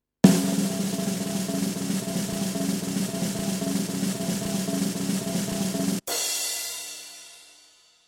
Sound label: Drum Roll, Classic snare drum roll with cymbal hit; Miscellaneous Musical